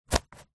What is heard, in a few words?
sport soccer boots drop on grass; zapsplat